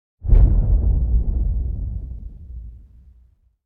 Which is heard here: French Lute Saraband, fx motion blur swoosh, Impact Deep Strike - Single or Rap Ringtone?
Impact Deep Strike - Single